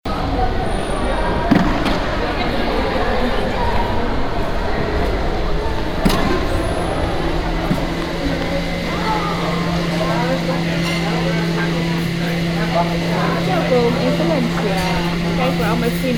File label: valencia collage field recording; weeklybeats 2026